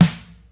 Steel Snare Drum Sound E Key, steel snare drum sample tuned to the E note